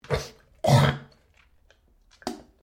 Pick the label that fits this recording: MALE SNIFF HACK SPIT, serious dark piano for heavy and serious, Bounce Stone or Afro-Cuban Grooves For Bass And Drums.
MALE SNIFF HACK SPIT